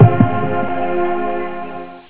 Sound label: ringback